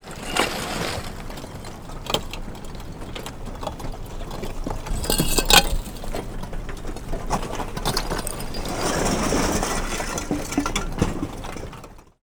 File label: lava cooling